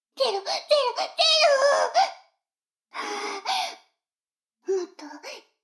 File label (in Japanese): シコシコ音声; 逆時間停止されて